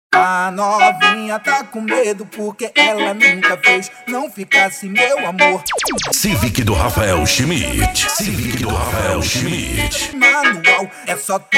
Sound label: funk mega bass